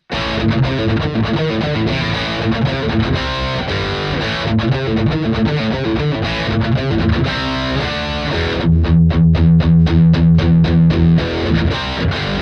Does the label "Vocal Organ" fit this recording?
No